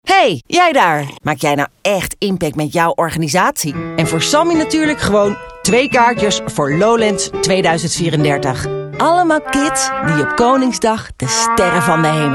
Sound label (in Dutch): speels